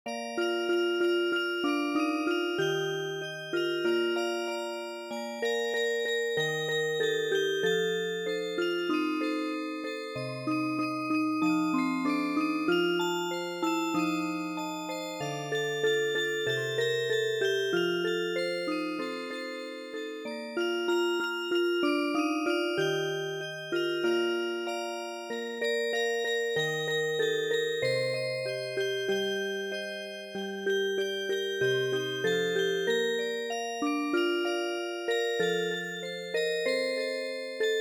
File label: Music Box